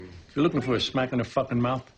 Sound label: Rock